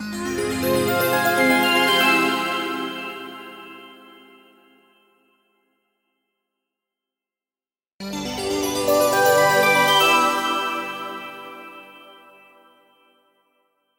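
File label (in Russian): яркий подъем по шкале синтезатора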